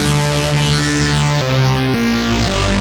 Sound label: Resos B, 170 bpm, D, modular; modular madness